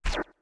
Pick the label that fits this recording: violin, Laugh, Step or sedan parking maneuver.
Step